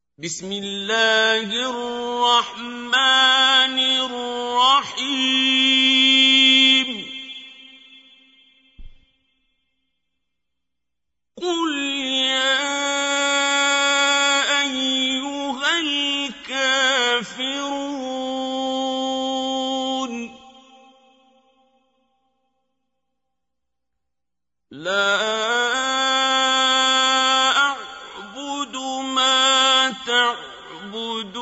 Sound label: Recite, qari quran; Surah Kafirun; Qari Abdul Basit